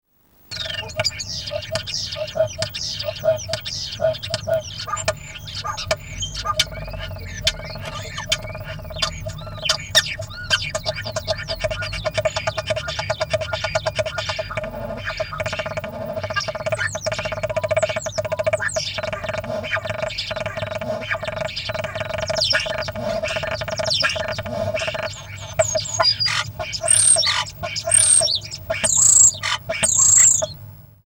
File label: starling